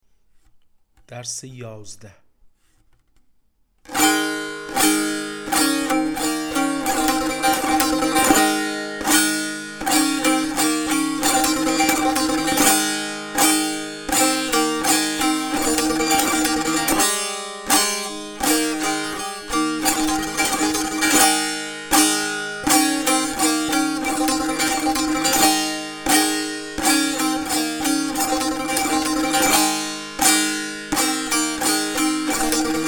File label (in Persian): مشق تنبور